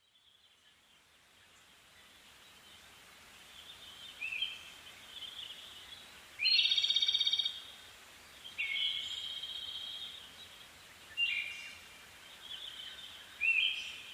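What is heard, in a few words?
Siberian thrush; mamijiro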